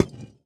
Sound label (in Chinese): 饰纹陶罐插入失败声